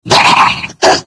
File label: die